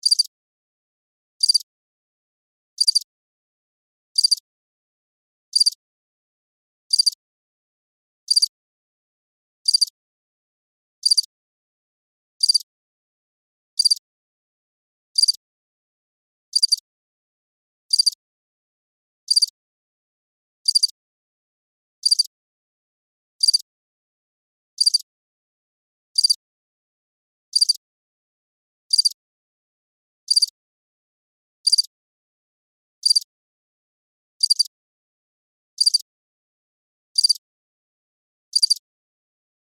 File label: Cricket, Chirps, Ambience, Night, Insect, Bug; Crickets; Blastwave; Animals:Insects